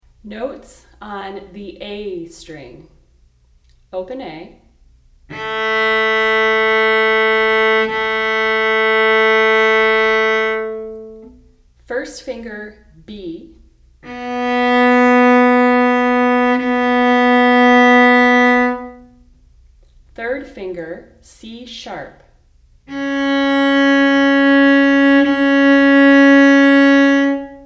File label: Notes A String, cello; Notes on the A String; 1st Position Notes on Each String – Open, 1st, 3rd, 4th Fingers